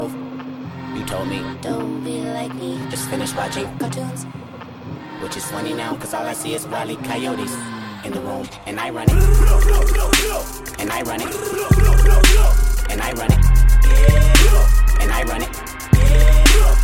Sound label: Cartoon Cereal